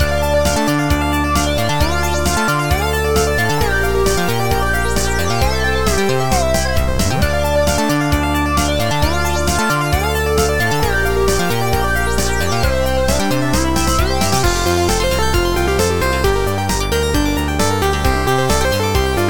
Loop Pop2 133; Spacejacked; PS Vita

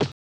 hit; Bishoujo Senshi Sailor Moon; snes

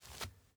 dirt jump

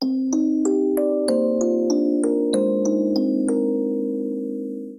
Baseball Jingle Sad

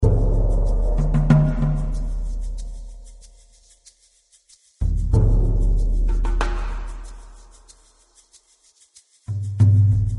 menu pulse; Might and Mayhem

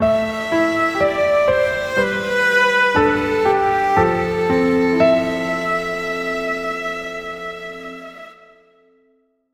Die; wisgr windows gamerip 2018

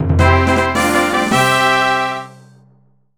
Powerup Jingle

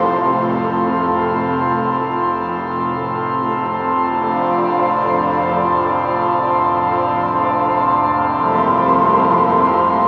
Flood Loop Organ 115bpm; jenny leclue detectiv

